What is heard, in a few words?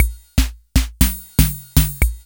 FILL, KR55; INIS - Funky Xtreams; KR55 LP4